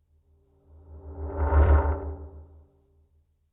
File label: woooaooommmm